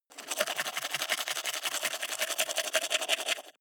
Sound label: teeth brush wet open inward fast